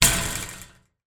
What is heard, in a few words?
household gate metal hit wobble